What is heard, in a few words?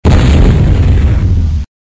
music compare lost